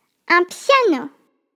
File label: PIANO